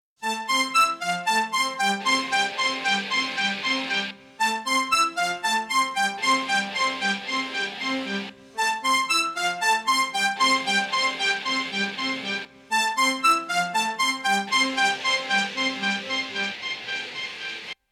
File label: Keys; Multitrack